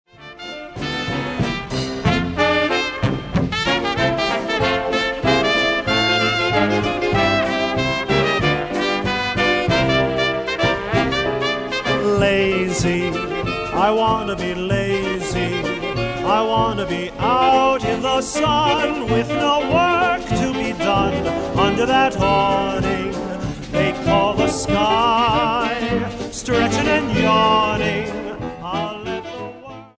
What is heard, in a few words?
vocal